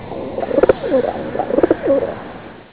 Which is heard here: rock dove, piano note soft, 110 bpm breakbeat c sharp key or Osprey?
rock dove